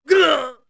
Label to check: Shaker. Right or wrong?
Wrong